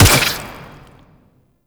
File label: Infested Burst Rifle Shot 2D, weapons